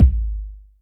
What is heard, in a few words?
BD dance, Electric Kick, Yamaha DTXpress